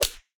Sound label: mob spanking hard